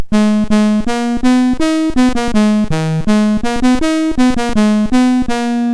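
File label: Pipeline BASIC, MOS 6581 SID chip music, games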